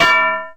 loose points; eiffelmedia; brick breaker

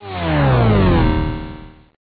drive left; eiffelmedia; brick breaker

